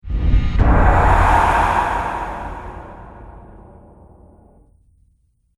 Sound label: Demonic Voice Impact, Monster Sound Effects